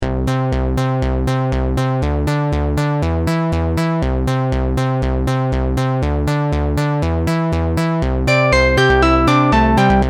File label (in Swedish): dator i telefoni bas melodi